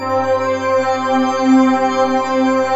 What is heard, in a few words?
JUNO DX7; Rubber Chicken Software Sample Bank